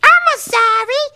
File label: hunter death; catnap n grabpack; elmo